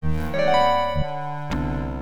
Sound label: Effects Undo